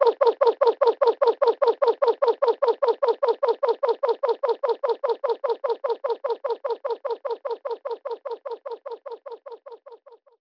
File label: Pigeons